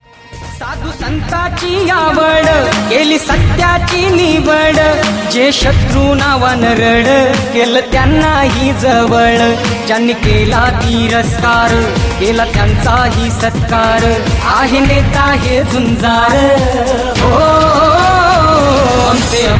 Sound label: Song ringtone